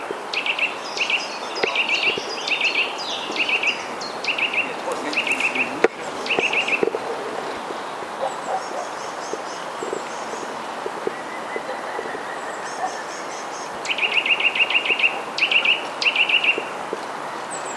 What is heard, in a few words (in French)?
sittelle torchepot alerte